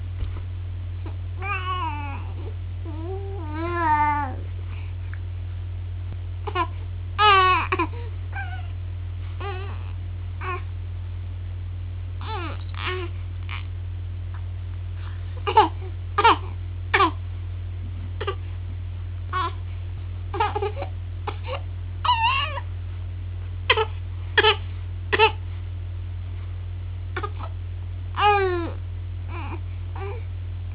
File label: fussing